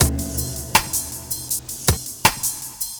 Beat, Futurist Drum & Bass; Drums & Percussion

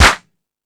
House Machine, Drum Machines

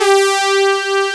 BR G3; AKAI S-Series CD-ROM Sound Library VOL-8

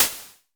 SN Basic, Vermona Mono15 Drums Snare

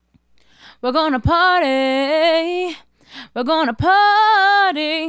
Female Solo, Hip-Hop and RnB Vocals

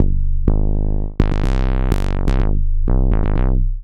Slo Bass; Sonic Foundry (Sony Creative Software); Underground UK House & Electro

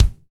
BD, BD REAL

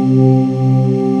DIGI WAVE, DIGI PAD

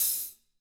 HTRA LOOS, Drums, TAMA; ILIO; Double Platinum Drums 1; TAMA+TAMA

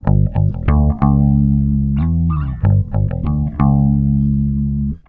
STRANGE 94 G, Bass Grooves; USB Soundscan vol.30; AKAI

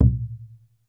PUEBLO, American Natives; E-MU Formula 4000 Series Vol. 4; Earth Tones

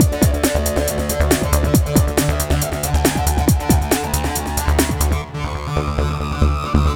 Moogyourass, 138 Dmaj, Hardcore Breakbeat; Big Fish Audio; Hardcore Breakbeat Action

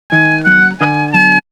Wind; AMG; Brass n Wind

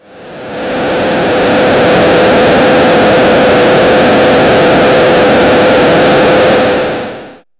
Scary, nightmares; Dream Zone

